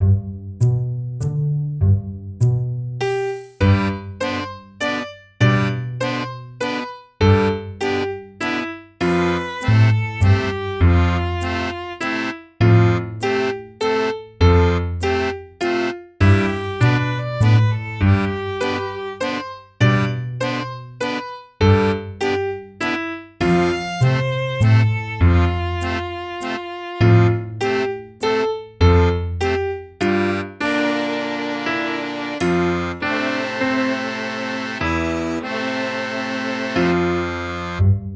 piano e acompanhamento; 6ª fase

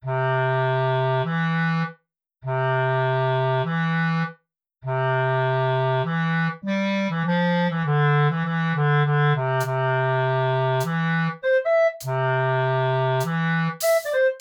Família Diapasão (sem piano)

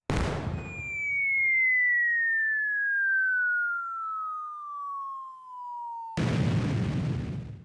tiro canhão

